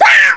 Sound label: death; vortex; unit01